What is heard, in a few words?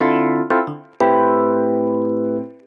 PIANO 90 A; Keys